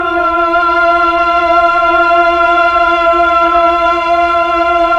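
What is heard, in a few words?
Pad Tron Violin & Choir F5, Slow Str+Vox; Keyboards of The 60's and 70's; Melo. Str+Vox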